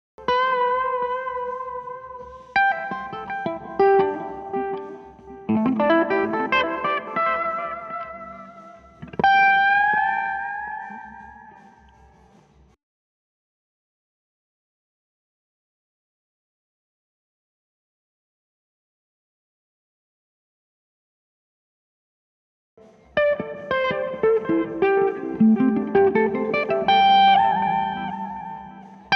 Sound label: guit